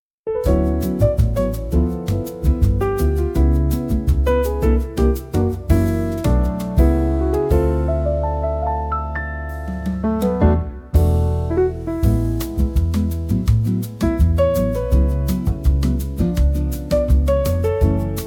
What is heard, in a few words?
City Rain Melody, ジャズ, ムーディーな一曲; ジャズ,カフェ,夜,バー,静か,大人